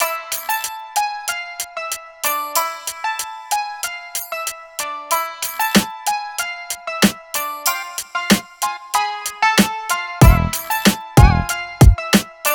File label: 094 Emin, Hip Hop Exotica